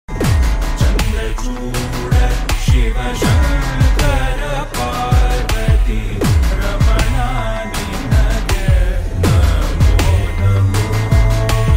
chandrachooda bhakti ringtone bholenath ringtone shiv ji ringtone